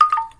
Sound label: Tight, notifications; IUNI; IUNI OS 3